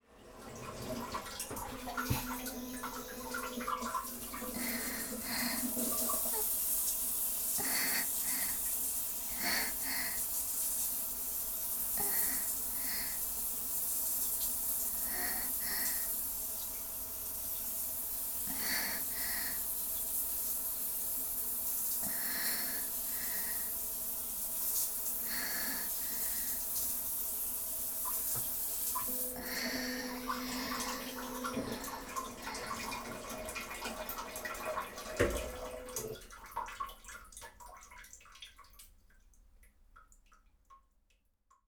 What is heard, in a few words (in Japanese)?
ウォシュレット, トイレ; トイレ盗聴 排泄音集; バイノーラル